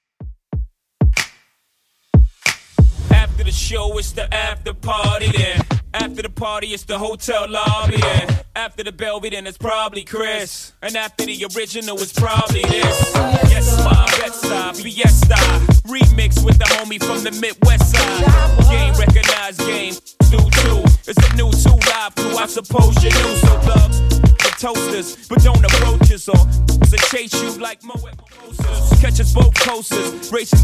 Throwback Rnb 93bpm

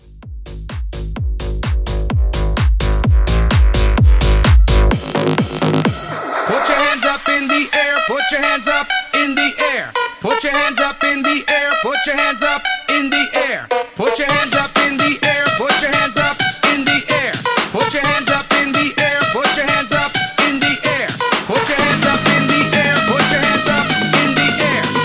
Club Hype 128 CLEAN

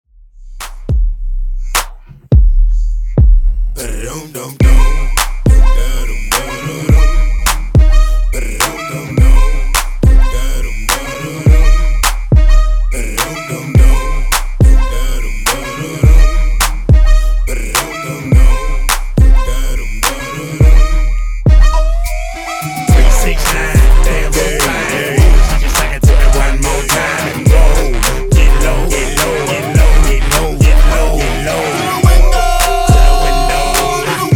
Redrum 105bpm

